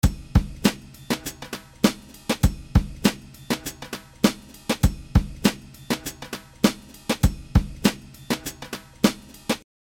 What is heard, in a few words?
drumloop Unprocessed